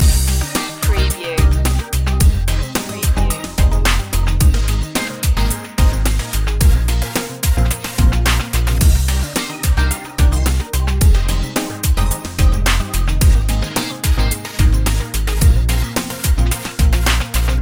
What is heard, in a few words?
electro groove loop